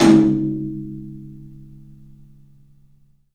TOM, Low Toms, 3D Percussives

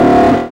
FX Dance

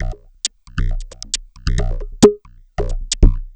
Drums Strange; Sonic Foundry (Sony Creative Software); Machine Language

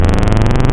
Flux Saw Flange, wavetables; Animoog

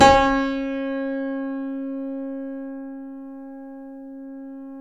C7 FF, The Ultimate Piano Collection; East West

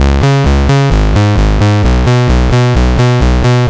101 saw seq C# 130, synth loop; Synth Loops; Zero-G; Soundclash Nu Dance Electro; Acidized; 130bpm

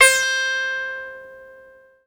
tabla + sitar, Mirage, c6; ProSamples vol.55 - Retro Sampler

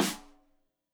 Rim Shot Stereo; Deeply Sampled Drums; SMDrum

